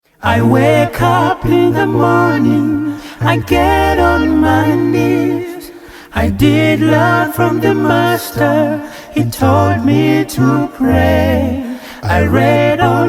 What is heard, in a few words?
Acapella